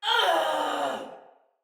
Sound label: Death, Soldier Female; Battle; Barks; Dialog; Sinecure Soldier Female A